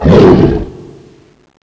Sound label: zombie attack; DayNightSurvive